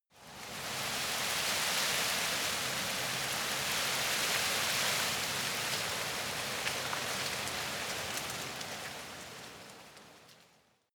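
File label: tree leaves rustle soft, garten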